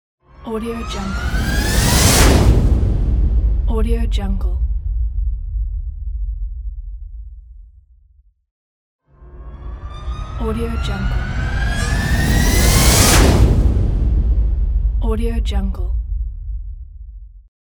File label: jet whoosh fly by